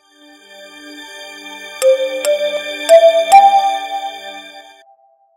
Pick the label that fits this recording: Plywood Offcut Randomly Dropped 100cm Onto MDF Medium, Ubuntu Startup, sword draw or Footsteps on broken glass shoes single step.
Ubuntu Startup